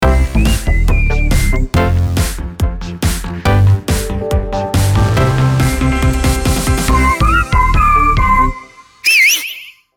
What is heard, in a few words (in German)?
ringtone